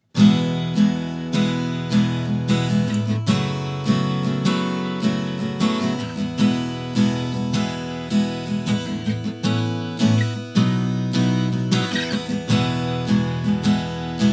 pick; MusicMax

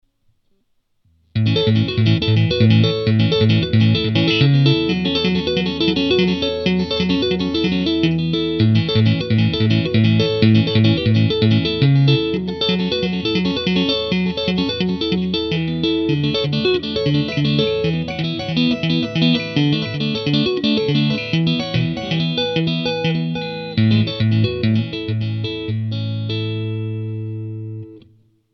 taping

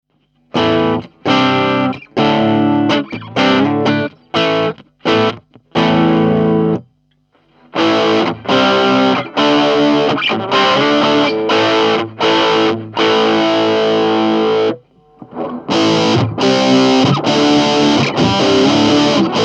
bridge hb clean drive distr

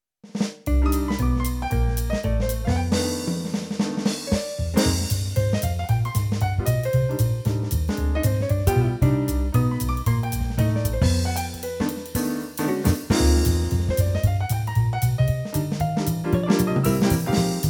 ctk-6200

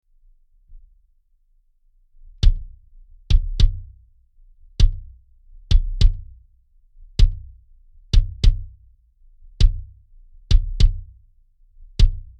pr40 comp & eq

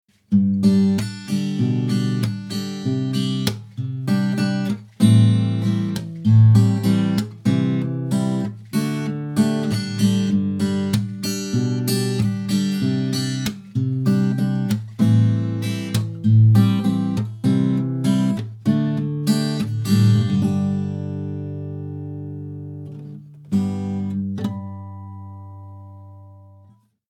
fingers; Crafter; MusicMax

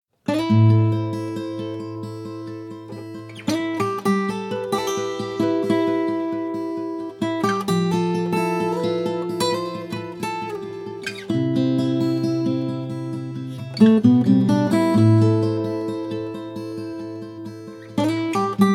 Crafter MD42/TR

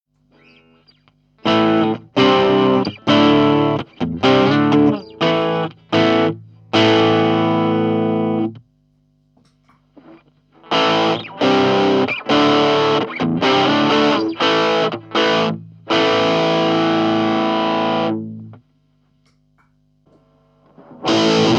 drive distr; Boss SD-1; Digitech Grunge; Cort Zenox Z-42FT

